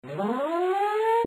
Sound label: warning; MineSeeker